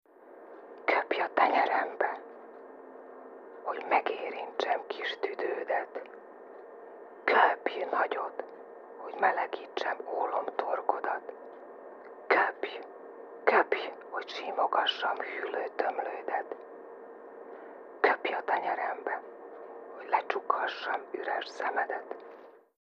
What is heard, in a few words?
best of disco